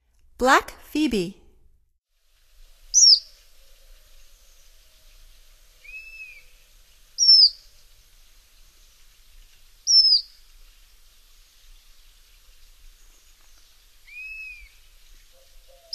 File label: Black Phoebe, Songs of Birds of North America; Stokes